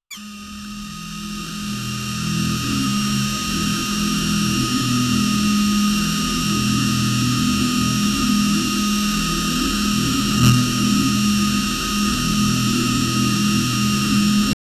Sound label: sci-fi power generator; buzz